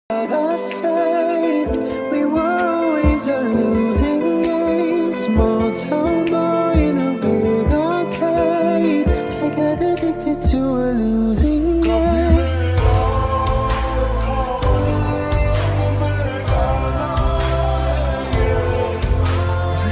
Hindi × English mashup ringtone

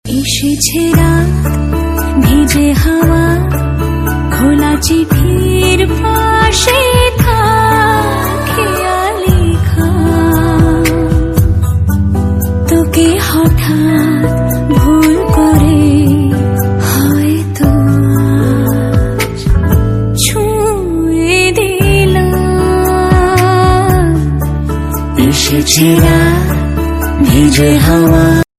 bengali ringtone

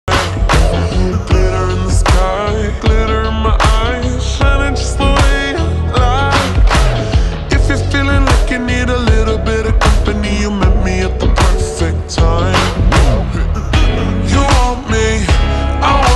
aesthetic english ringtone

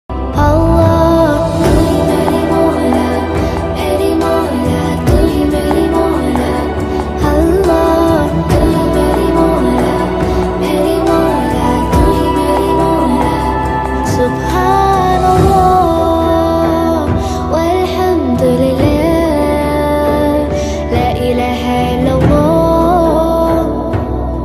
arabic ringtone

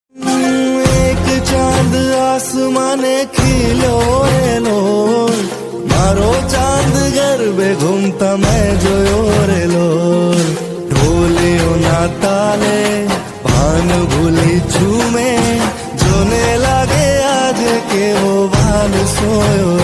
gujarati ringtone

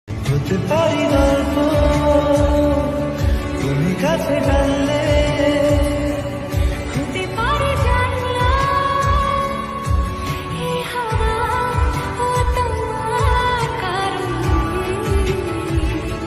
lofi bengali ringtone

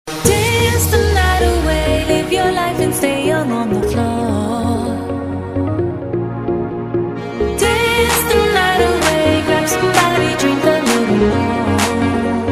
english ringtone

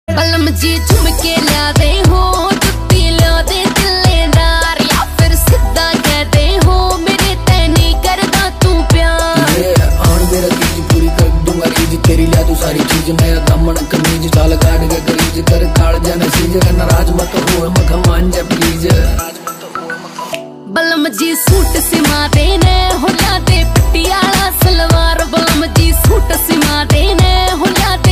haryanvi ringtone

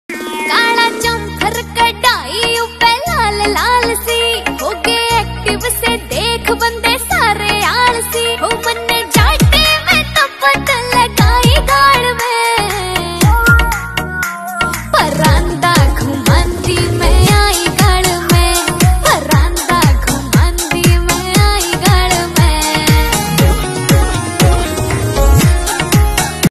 haryanvi ringtone

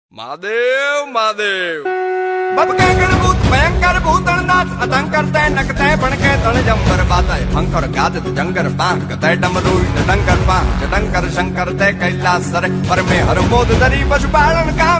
gujarati ringtone

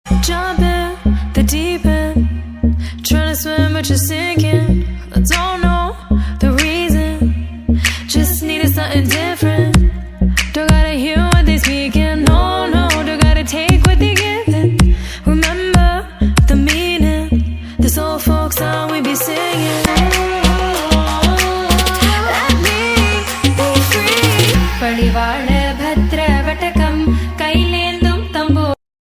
tamil ringtone

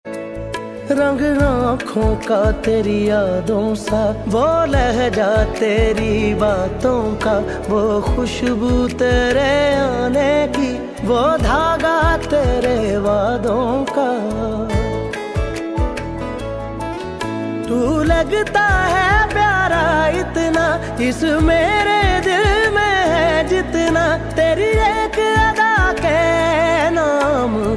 Ost pakistani ringtone

